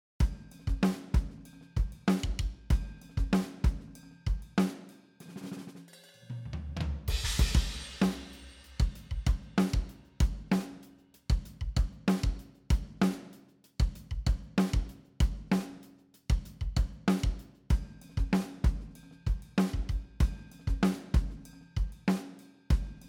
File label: Modern White Full Rock